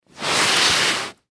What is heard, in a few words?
Object Drag On Cement Scrape; Dragging; Objects; Blastwave; Foley